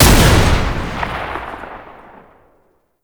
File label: Bayonet Shot; Warframe; Tenno